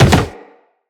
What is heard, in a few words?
Operator Land Soft Wood, Footsteps; Characters